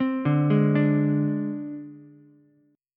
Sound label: G sharp Major Flat 5th, Ukulele; G#Mb5 arpeggiated